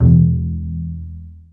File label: Bass Piz E E0; Digital Sound Factory Virtuoso